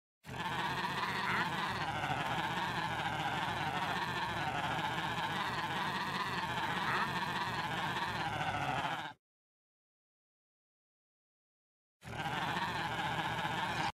Minecraft llama

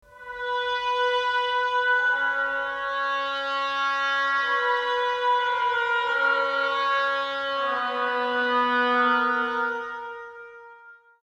oboe melody; ساز ابوا

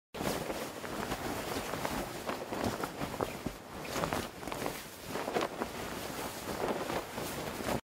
Parachute; چتر نجات

